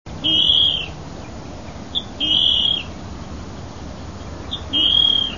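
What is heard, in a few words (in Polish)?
modrzyk, chrusciele rallidae; Modrzyk gniazduje na wystających z wody trawiastych wysepkach i kępach roślin oraz zwałowiskach trzciny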